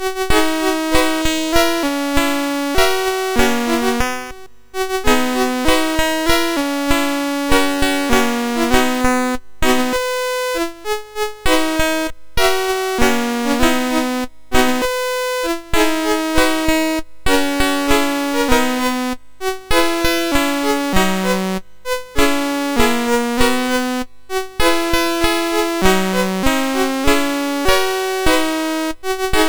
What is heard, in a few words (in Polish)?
Wesola Choinka, MOS6581R4; sid